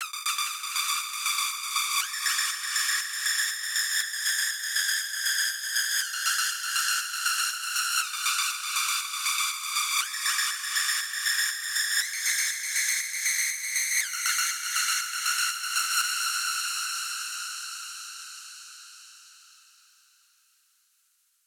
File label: Pad; Level 2.1.4 Pad